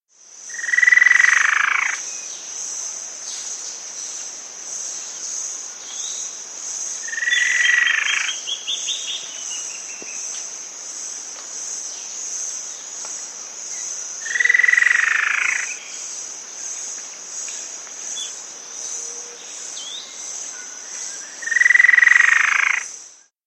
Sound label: Spot-Backed Antwren, S. America